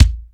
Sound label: LOW BD, DRUMS; MOD.DRUMS; East West; Global Sounds